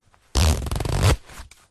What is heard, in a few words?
foley clothing body warmer nylon zipper short zip